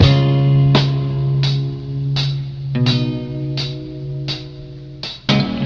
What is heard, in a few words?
guitar, Loops Phonky